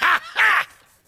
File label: ha ha, voice; ooh ahh